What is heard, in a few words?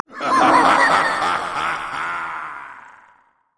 cannon barrage; LoL; gangplank